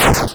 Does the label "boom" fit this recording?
Yes